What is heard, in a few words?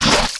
Crab Attack